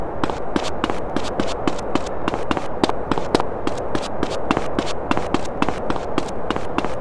corriendo

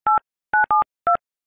teclas de celular

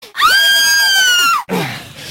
grito

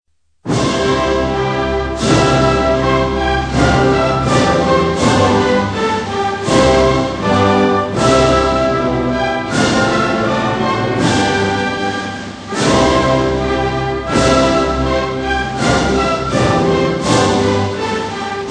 himno de espana

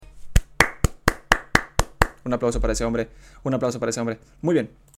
un aplauso

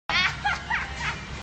risa

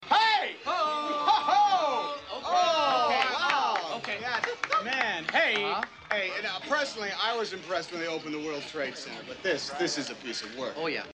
oh aplausos; tom hanks

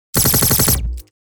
laser rafaga

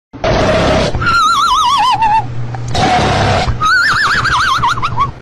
perro durmiendo

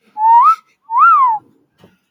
silbido de obeso

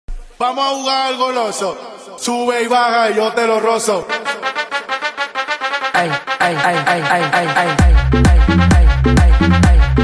guaracha aleteo zapateo

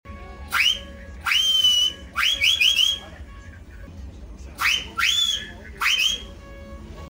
chifle

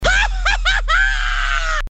risa loquisima

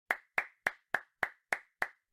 aplausos

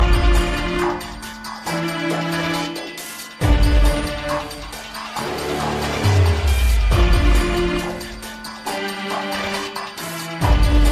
acento musical agitado

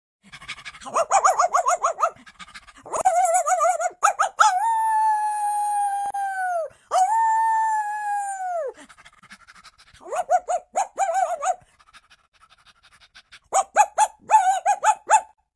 perro ladrando

